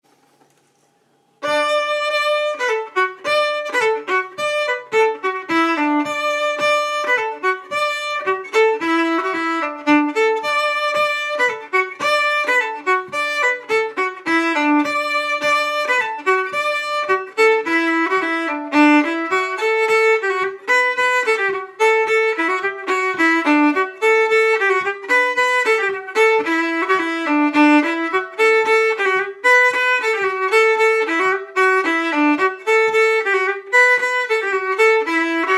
Polka fast; Bill Sullivans Polka

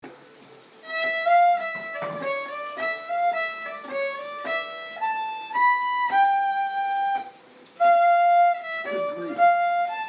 Lång Dans waltz time

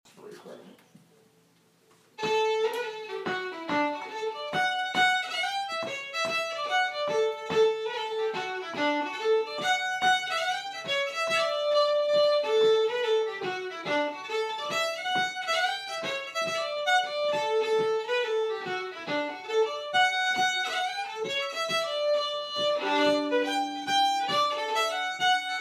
Mazurka From Õstmark In D

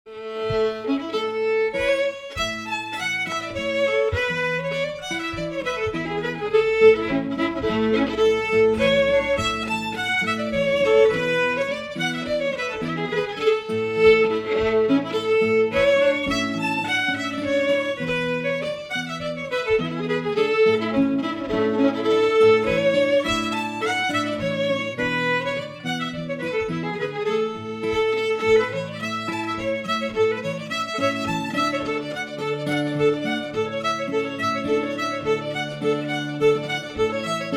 Polska Low

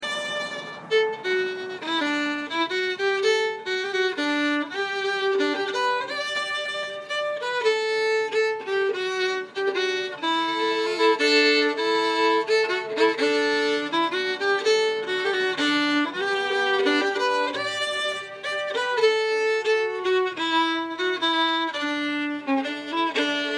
Waltz

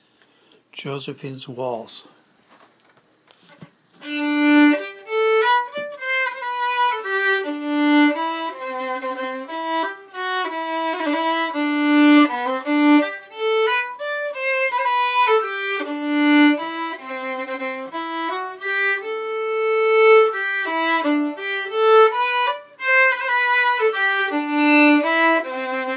Waltz

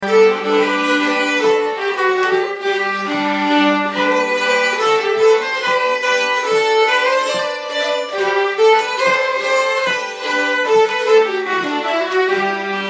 walking tune

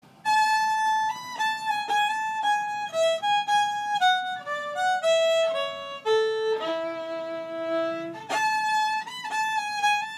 Waltz In A

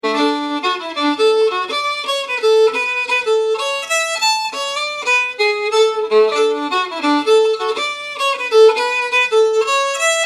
Polska

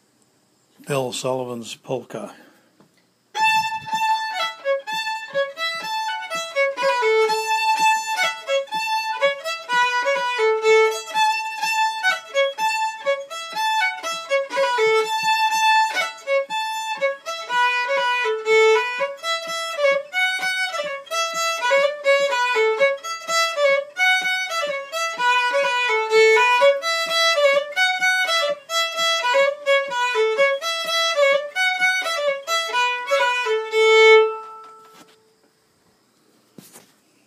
Polka

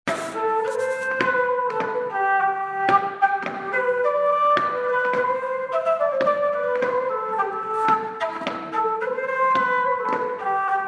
polska

